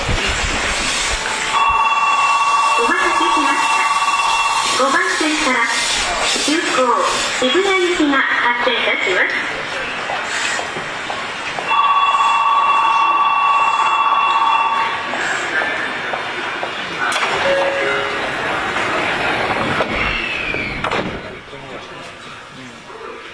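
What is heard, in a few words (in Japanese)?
走行音, 9000系; Tokyu; 自由が丘-学芸大学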